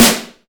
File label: perfect steel snare drum A key; Duration 0:01; Samplerate 44100 Hz; Bitdepth 24; Channels Mono